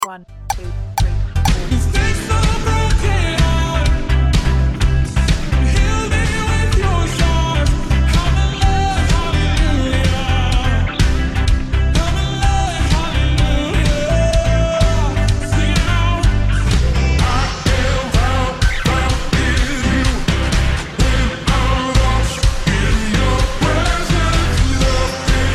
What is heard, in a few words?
guitar